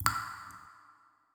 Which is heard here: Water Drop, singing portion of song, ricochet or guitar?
Water Drop